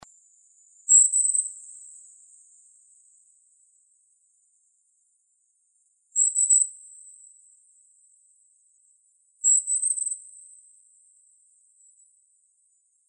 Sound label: CALLS, Wrens Kinglets Gnatcatchers; GCKI; deviche